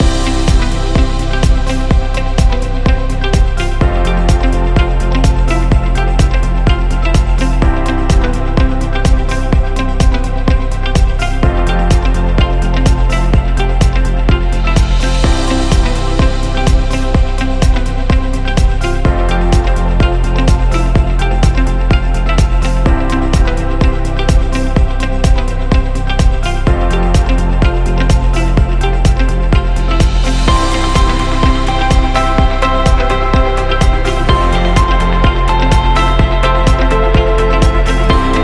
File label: Upbeat Loop